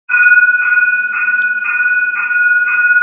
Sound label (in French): alerte tramway